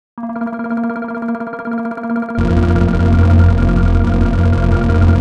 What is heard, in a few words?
Dreamy Vaporwave